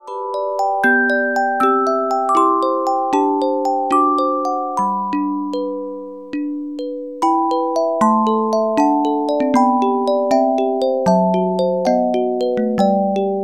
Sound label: Carillon Music Box Melodies